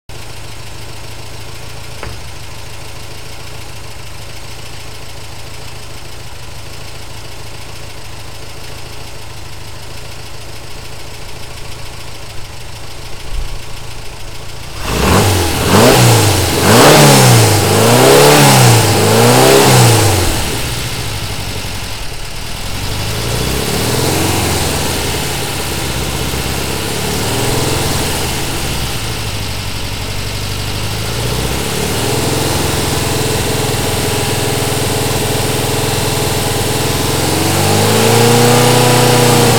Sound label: muffler